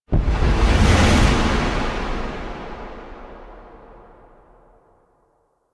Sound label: Pod Pulse Wave; Cinematics; Warframe; Orbiter Cradle